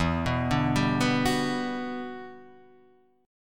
G Major 7th, E, Gmaj7/E chord; arpeggiated; JGuitar

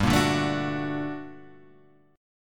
G Minor Major 9th, guitar chord; GmM9 Chord {3 1 0 2 3 2}; strummed

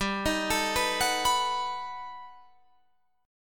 G Augmented Major 9th, Guitar, Open D

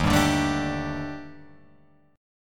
Eb Major 7th Flat 5th, Guitar Open F; strummed; chord

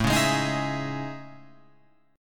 A Major Sharp 11th, Guitar Open F; strummed

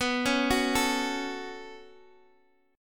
B 7th Suspended 2nd, Guitar, Open D

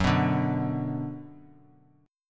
B Minor F sharp

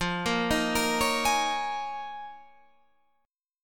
Eb 7th Suspended 2nd Suspended 4th

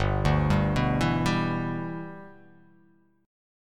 G 6th

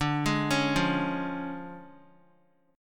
D Minor Major 7th Flat 5th, down, Guitar; DmM7b5 arpeggiated; JGuitar

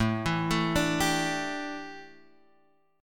A 7th Suspended 4th, A7sus4 chord; A7sus4 arpeggiated

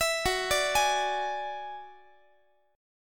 Gb 7th Suspended 2nd Sharp 5th Gb down Guitar; arpeggiated

